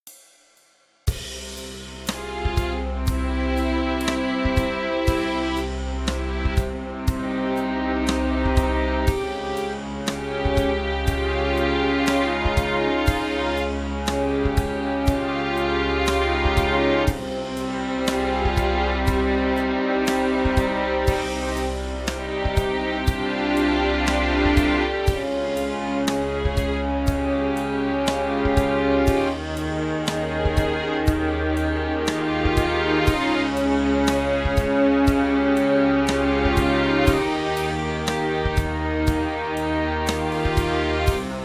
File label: Seldane Strings